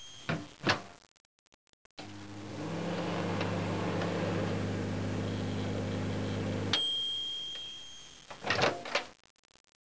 MIKROWEL

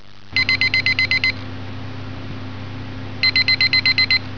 WECKER

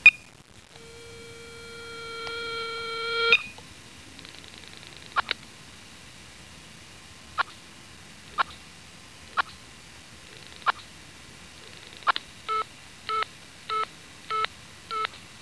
besetzt; 999wavs

